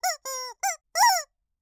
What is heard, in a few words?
sassy chicken clucks; wonder-junction